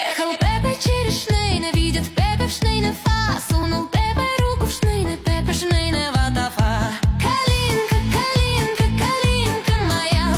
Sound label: pepe shnele fa folk version